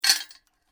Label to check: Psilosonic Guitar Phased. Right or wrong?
Wrong